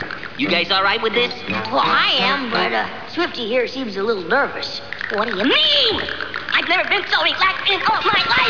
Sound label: Relaxed